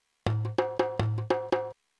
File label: danger bongos